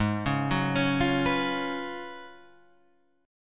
F sharp Major 7th Suspended 2nd Suspended 4th, G sharp